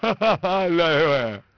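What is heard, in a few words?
male happy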